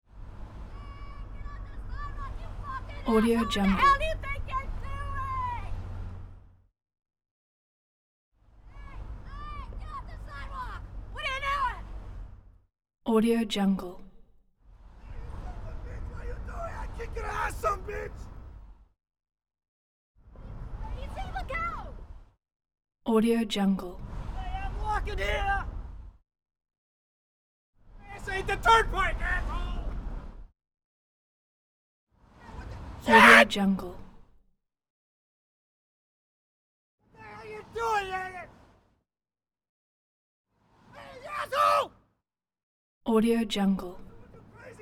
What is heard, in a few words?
shouting pass bys